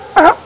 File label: Seal sounds